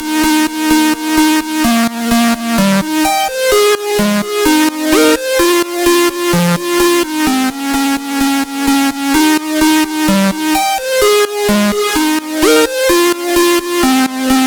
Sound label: Counter Melodies